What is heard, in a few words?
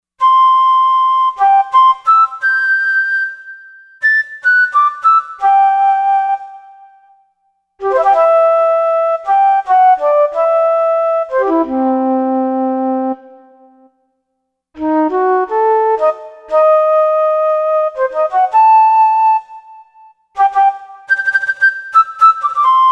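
Reed Flute, synth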